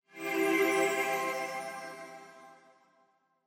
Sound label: Angel Short Bell